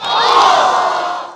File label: crowd boo; PUZMANIA